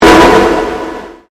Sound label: Convolution Pulse